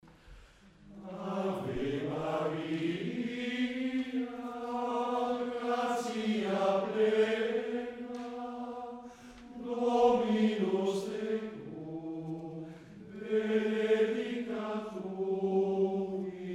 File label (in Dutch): Schola Ave Maria gregoriaans